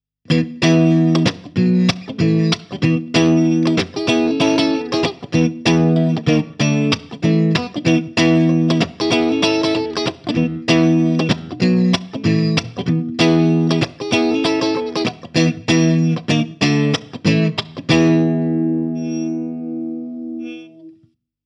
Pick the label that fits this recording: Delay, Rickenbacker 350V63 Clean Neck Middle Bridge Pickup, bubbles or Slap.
Rickenbacker 350V63 Clean Neck Middle Bridge Pickup